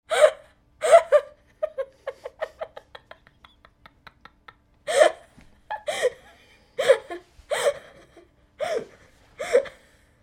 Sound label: Laughter Young Girl, Suppressed hysterical laughter; Voice; Tom Hutchings